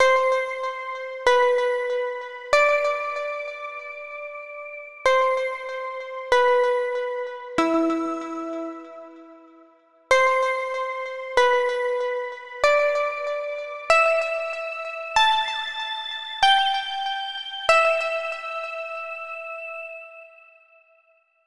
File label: Harp Melody